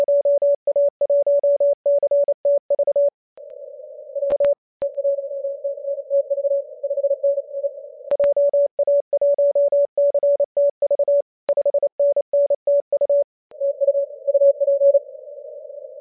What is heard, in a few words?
21mhz